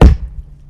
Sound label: Sh Block, Hatchet And Shield; Weapons; k8zan